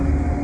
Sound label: Motor, Train; OpenBVE; 103KCJ-LowerCab